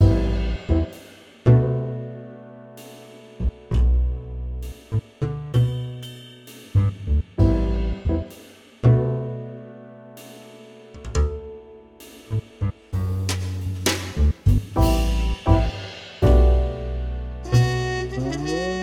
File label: Upright Nylon String